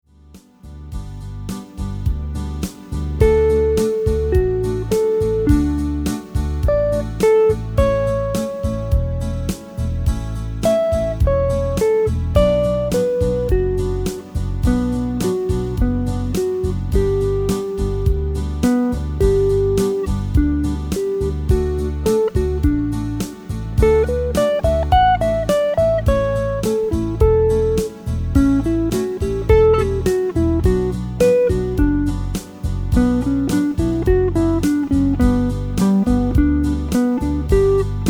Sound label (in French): impro sur I V VI IV